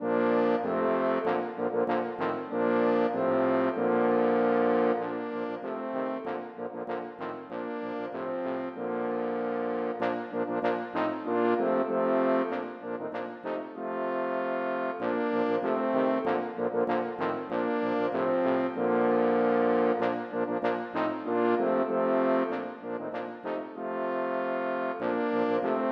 For fire baritoner tromboner

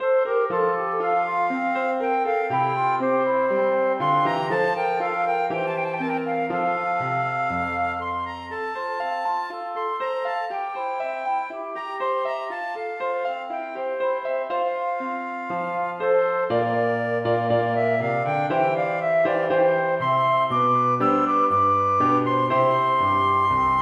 Allemand for fløyte, klarinett og piano; Bang; Jacob Mestmachers notebok er fra Bergen på 1700-tallet. Dette stykket er tenkt som en enkel øvelse i samspill.

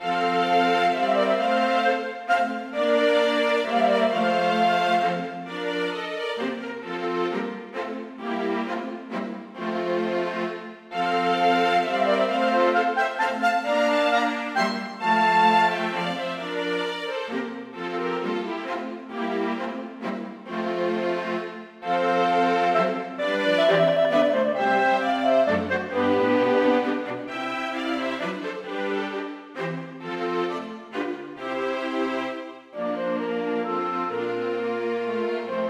Menuet for klarinett og strykekvartett, uten piano; Musikk fra det dansk-norske hoff på 1700-tallet

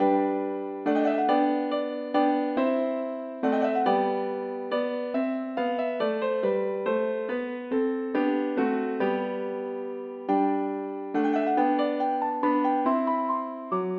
Menuet for piano; Aamodt

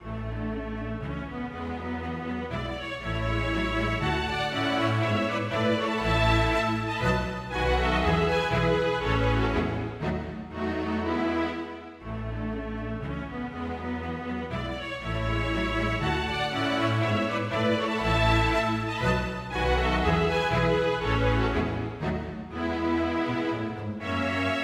Menuet for strykekvintett

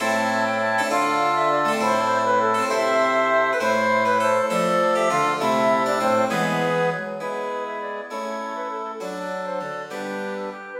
Gigue for blåsekvartett med Cembalo; Hertz

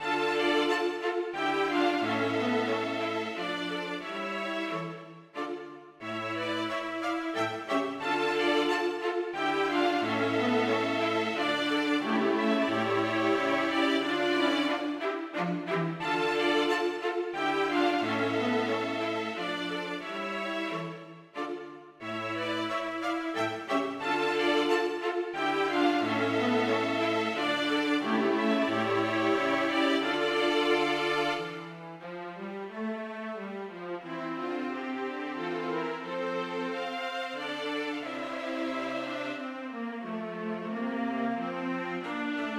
Menuet for strykekvartett; Hertz

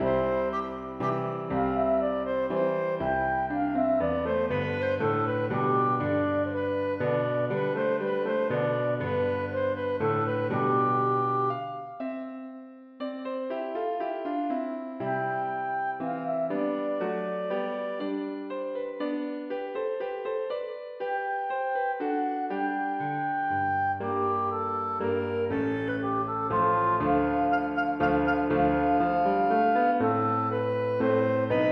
Menuet for klarinett og piano; Årstallet 1679 står skrevet i boka; Aamodt 001a

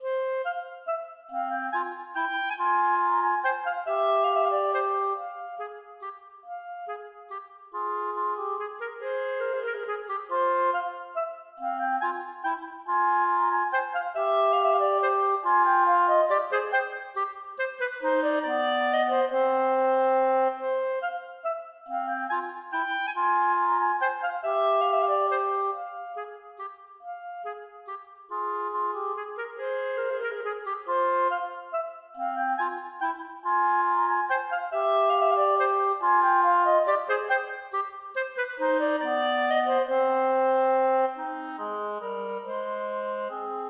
Menuet for klarinett duett; Aamodt 002d

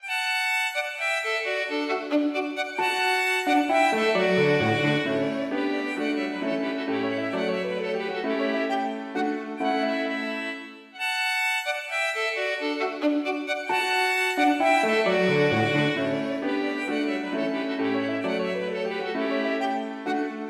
Menuet for to fioliner, cello og piano

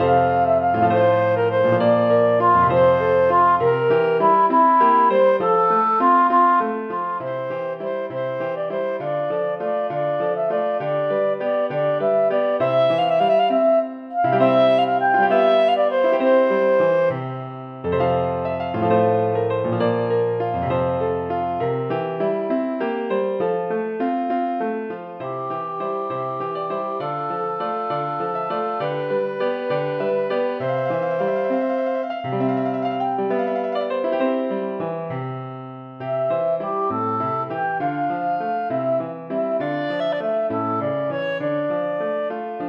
Gigue for klarinett og piano; Aamodt

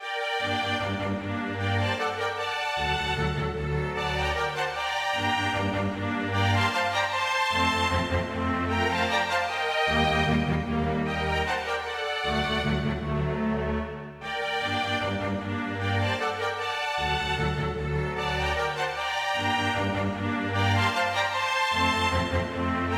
Menue for strykekvintett; Bang

